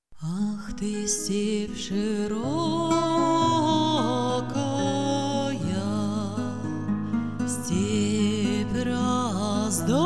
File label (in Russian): народная песня